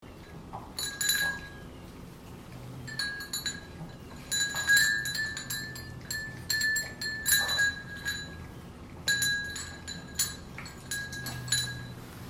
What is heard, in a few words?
dog dining bowls; DOG EATING / A CRUNCHY SNACK